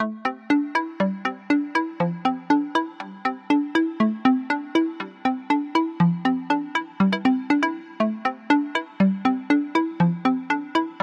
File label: Techno Timer